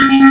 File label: Robotic Beep